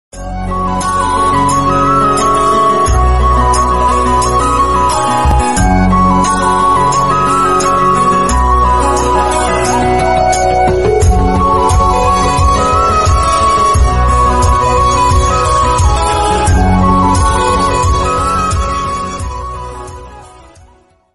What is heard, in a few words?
romantic theme love bgm; saathiya; mani ratnam; srk